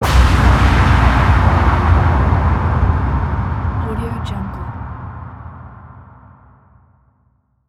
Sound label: powerful war explosion